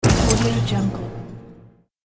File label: boom click